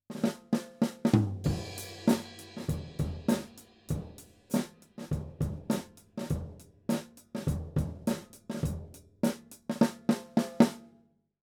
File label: drums stereo; akg 214